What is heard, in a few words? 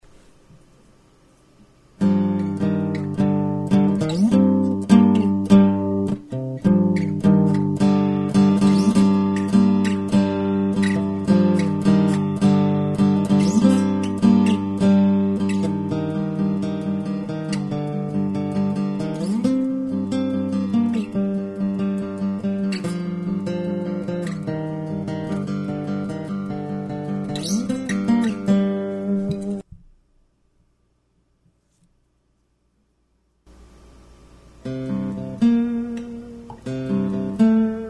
acoustic playing